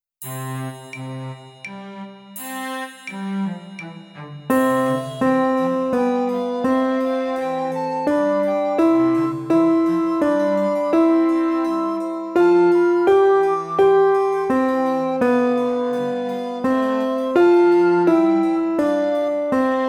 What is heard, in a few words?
Petite Minuet Slow